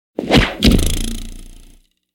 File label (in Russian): Звук ножа втыкающийся в дерево